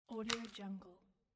oral irrigator tip removing

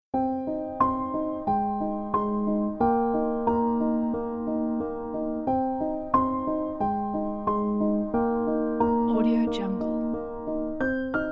soft warming piano melody

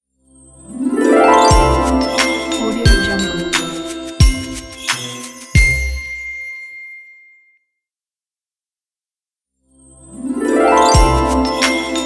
christmas ident

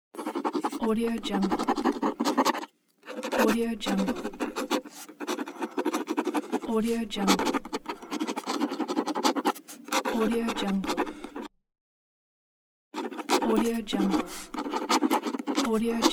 writing with pen loop

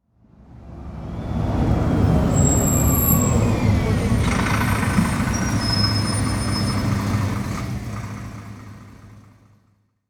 bus stop arrival

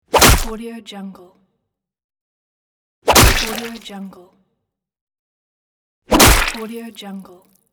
3d head crush gore

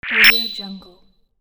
technical control button

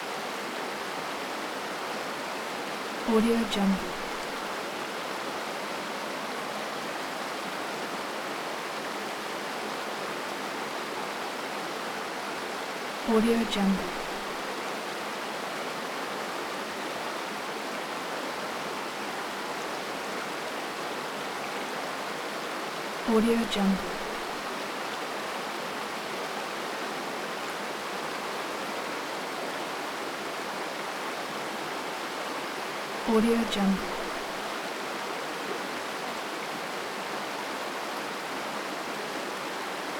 water medium stream loop; soundmorph

